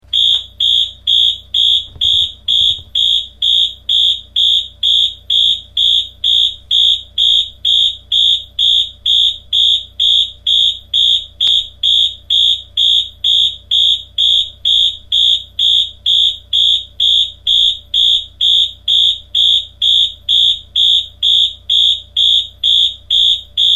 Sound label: wheelock mt 24 lsm march time horn, alarm